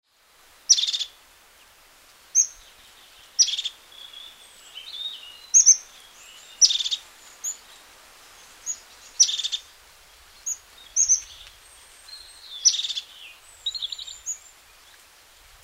crested tit

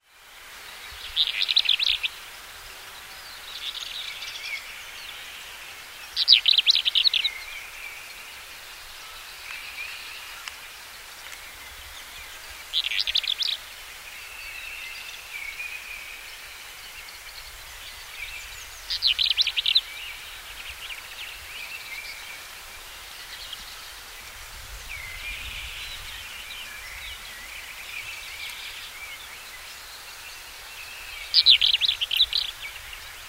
black eared wheatear

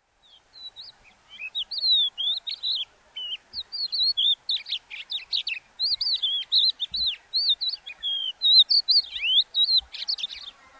crested lark

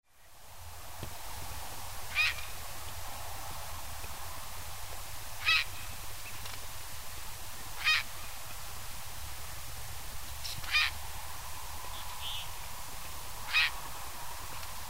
grey heron north craig reservoir

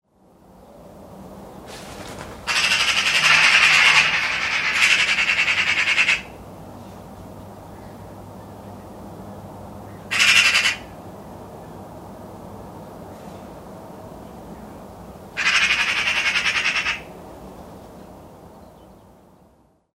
magpie nocmig

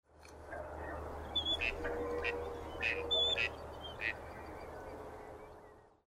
gadwall

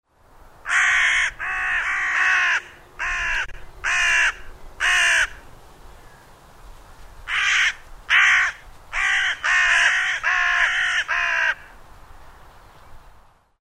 carrion crow

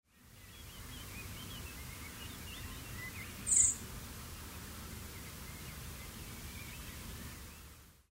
blackbird, nocmig

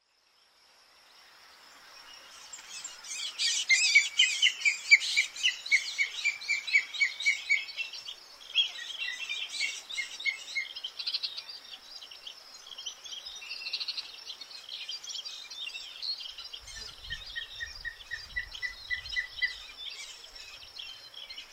black kite

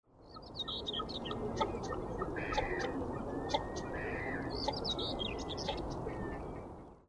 shoveler

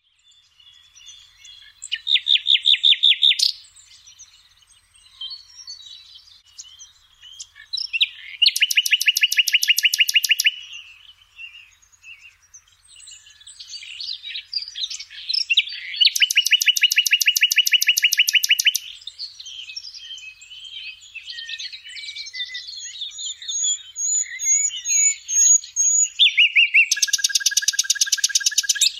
nightingale

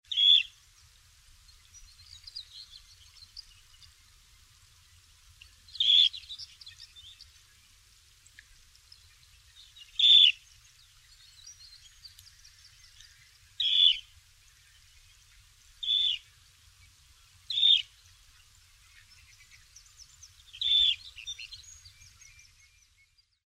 dunlin

